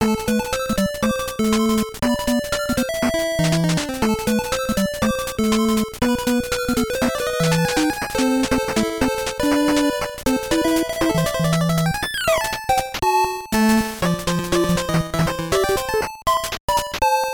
8-bit muzyka na sotovyi telefon ringtony

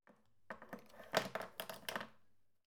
zvuki gubnoi pomady

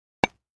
zvuki beisbola

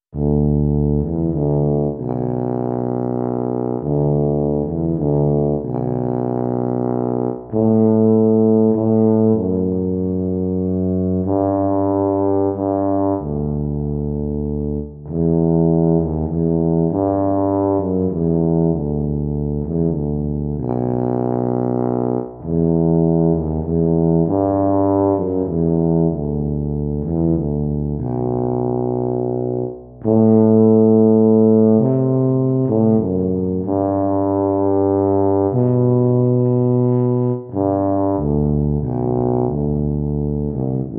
zvuki tuby

